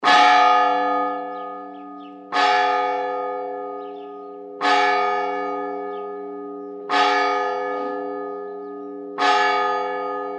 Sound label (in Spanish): campanas